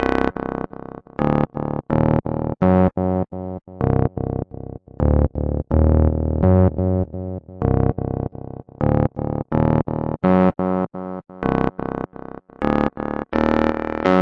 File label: 126 gnarly ominous filter bass dry; SYNTH AND ELECTRONIC LOOPS